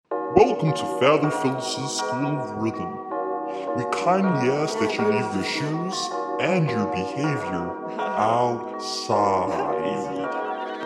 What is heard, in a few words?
Rhythmn